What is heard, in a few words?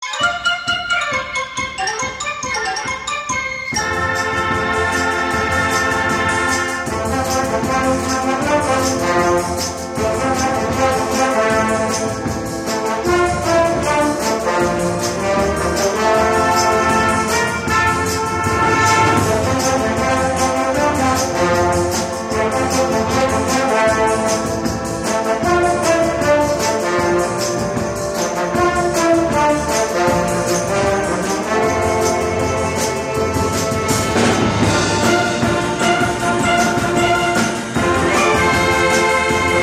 instrumental